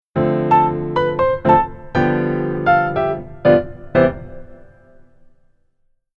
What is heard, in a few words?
Keyboard Solo Left Hand